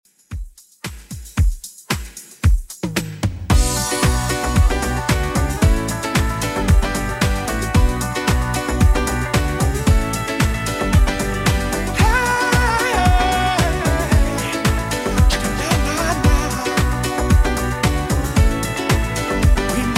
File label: 90s Redrum 113 bpm